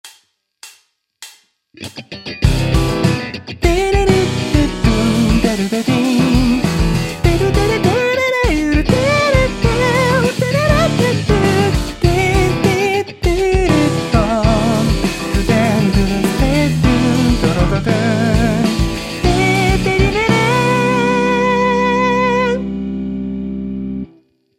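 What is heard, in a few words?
vocal mix reverb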